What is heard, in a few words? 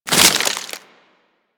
Melee Impact Wood; Warframe; Weapons